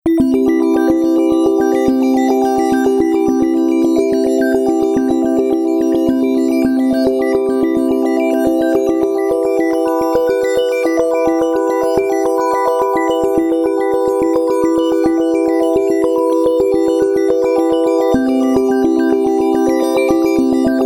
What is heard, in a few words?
Drifter; Noisebiscuits